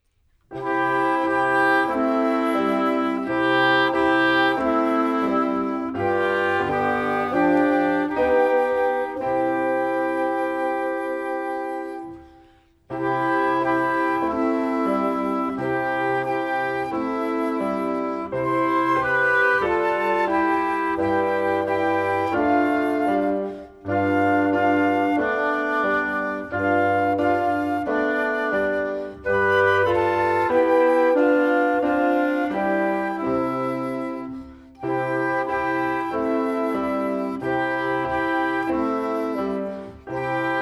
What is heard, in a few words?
reverently quietly music only